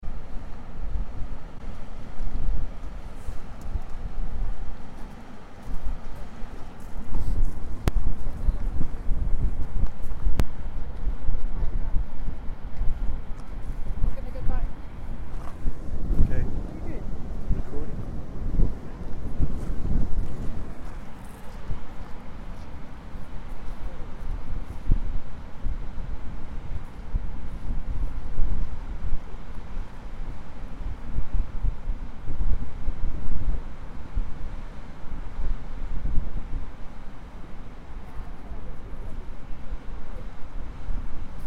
Gullfoss waterfall, Iceland, The sound of the wind, tourists and the